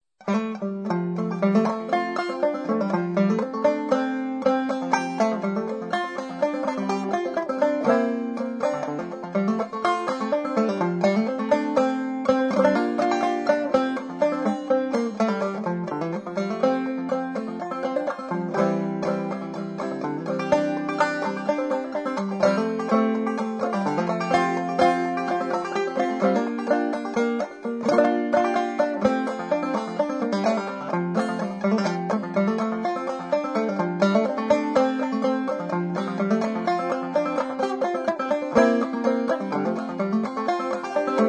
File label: Banjo Monologues